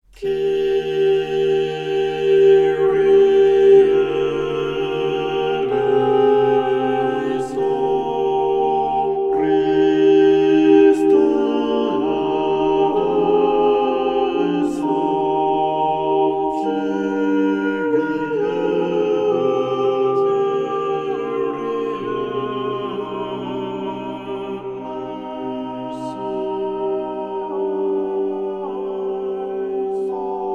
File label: Kyrie Mass; Byrd